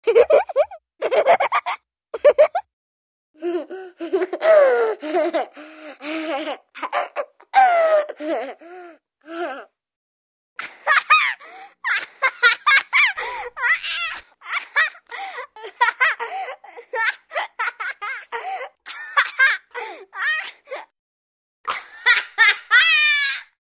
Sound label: Child laughing